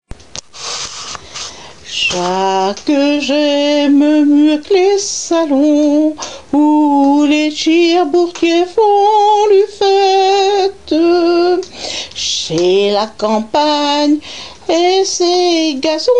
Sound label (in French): chanson en patois